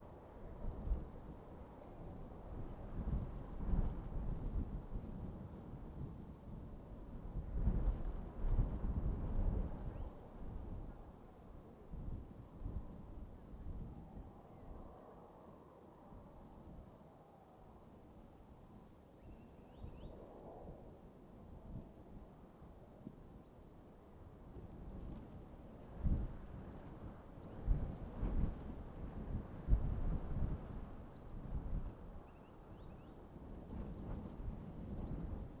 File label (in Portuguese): Vento moderado porem inconstante na grama na estrada de sao jorge; Coleção Sonora do Cerrado